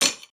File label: hit; breaking falling hit sfx